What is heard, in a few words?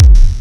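bass drum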